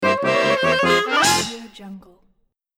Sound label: polka fail